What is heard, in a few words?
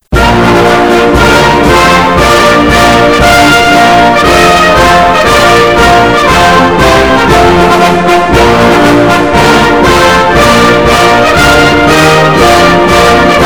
Lebanese national anthem